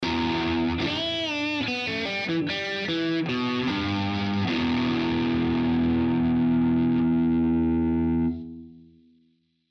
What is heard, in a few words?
fuzz